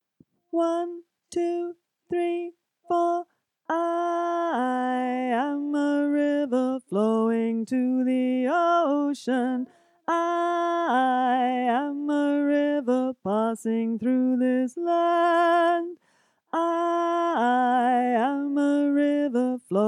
bass

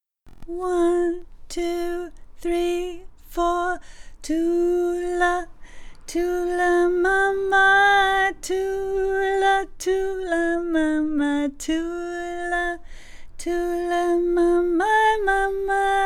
alto harmony

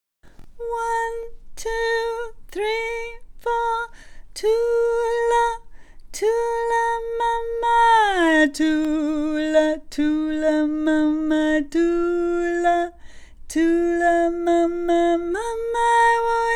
low harmony

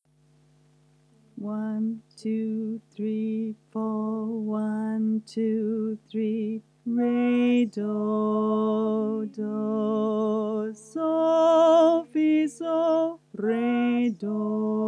Auld bass solfa chorus, Folk Choir; Auld Lang Syne Resources

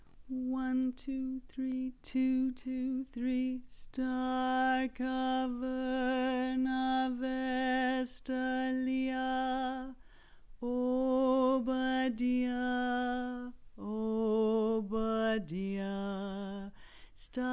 Unst Boat Song Low Harmony on doh, Folk Choir

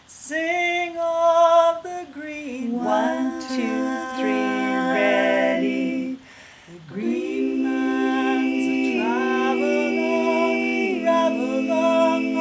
Practice Parts all; Woodford 22-23; Green Man

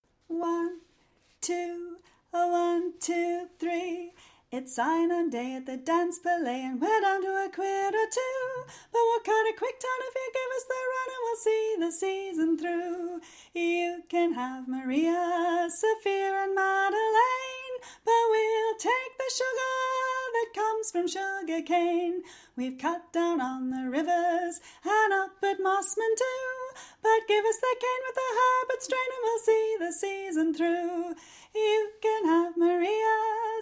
Sign-on Day alto-sop harmony; Folk Choir

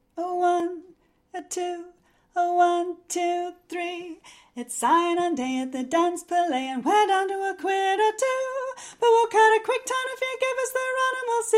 melody part; Sign-on Day; Folk Choir music

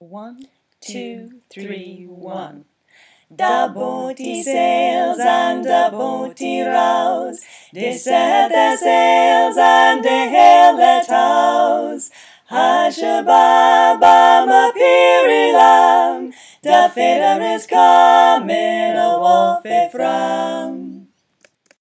Cradle Song, Folk Choir music; Da Boatie; Minnie O'Shirva's Cradle Song

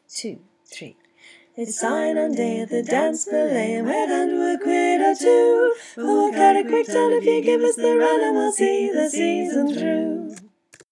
Sign On Day three parts, Folk Choir music

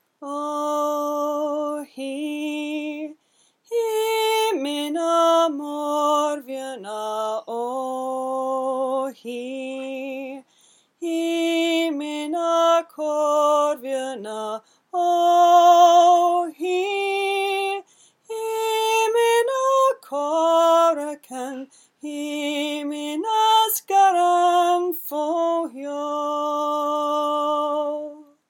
morbheanna melody, Folk Choir music

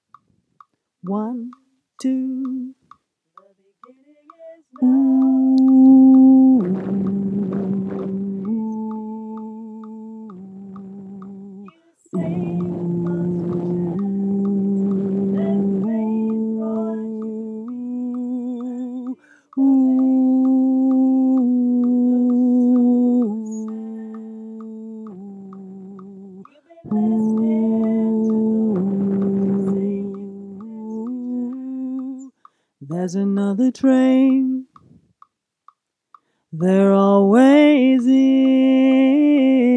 low part, Folk Choir

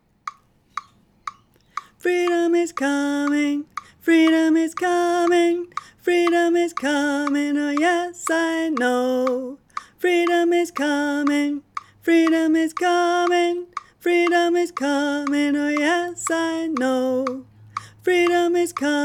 tenor with click

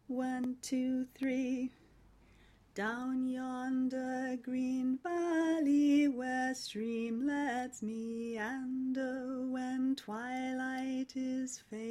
alto alto

